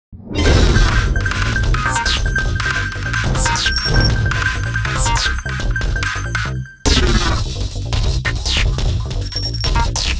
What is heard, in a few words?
Fast Comic, EDM; 리듬